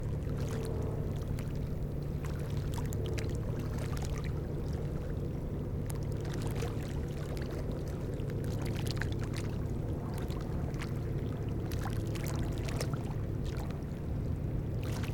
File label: river; Nayestane